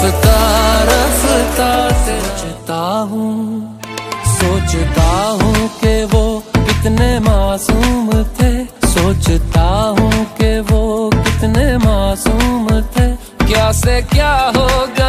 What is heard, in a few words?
bengali ringtone